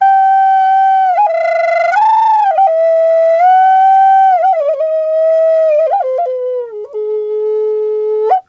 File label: A WILD, FLUTE WILD; Big Fish Audio - Didgeridoo and Other Primitive Instruments